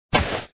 Ground; Dad 'n Me

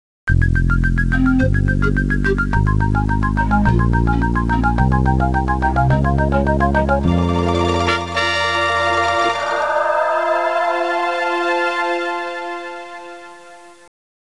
Startup; ABC World Reference 3D Atlas 97-98

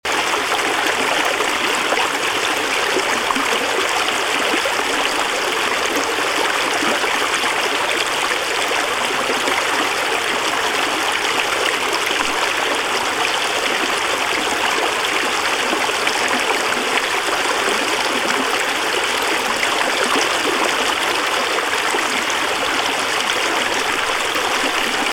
Water Flowing Loop; Ezra The Stranger; By Soundscaper

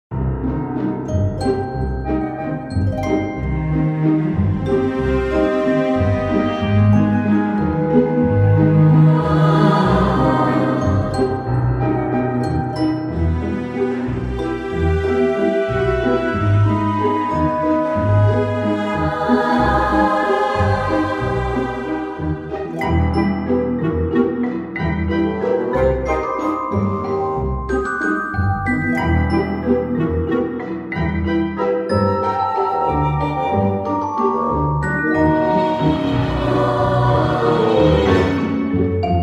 Ghost Waltz; Ghost Mansion Party

